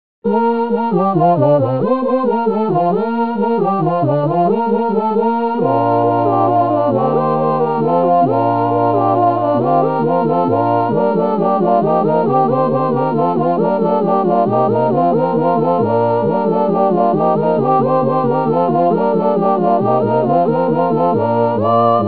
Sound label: All voices; Dance to your Daddy